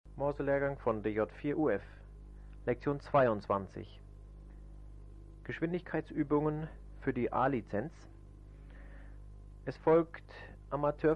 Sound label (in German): Morsen